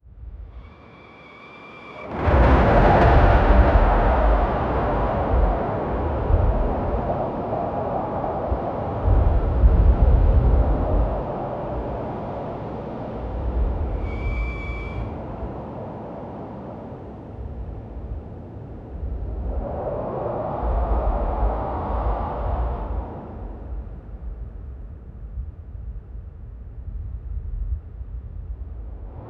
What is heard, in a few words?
eerie drone thunder